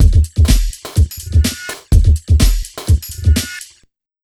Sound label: BIG 125, Big Beat Drumloops; USB Soundscan vol.25; AKAI